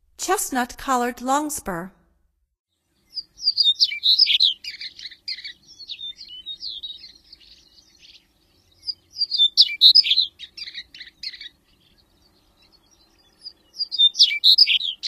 Chestnut-collared Longspur, Songs of Birds of North America; Stokes